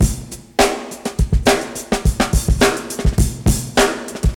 104 bpm drum loop sample g key, drum groove - kick tuned to the G note